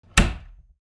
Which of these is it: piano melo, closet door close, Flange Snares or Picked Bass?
closet door close